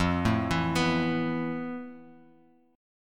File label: F Major Sharp 11th, Bass Standard; arpeggiated; chord; FM#11; 13,12,14,16; JGuitar